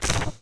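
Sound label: Force Field Spark; naboo; Star Wars Obi-Wan; XBOX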